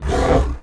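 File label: snort, sonak; giants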